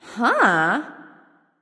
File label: confused; smite; voicepack